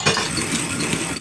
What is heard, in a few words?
Small Metal Shutters; Playable Tutorial Level; TR3